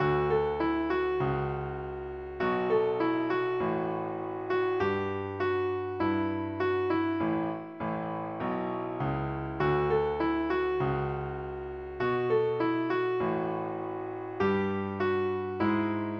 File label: piano; PSE Music; normal tempo; PlayScore